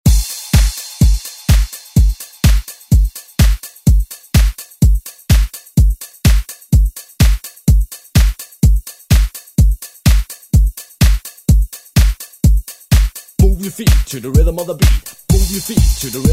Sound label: 90s ReDrum; DJ Mhark